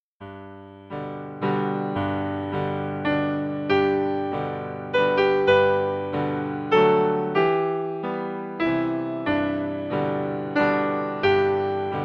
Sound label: Piano